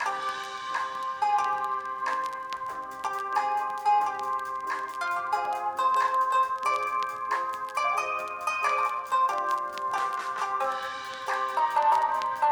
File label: amb Jiji Radio